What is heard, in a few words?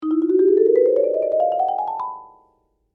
Marimba Ascend, Mallets; Musical